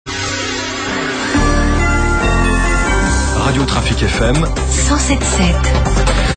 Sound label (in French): Radio New top horaire; Trafic FM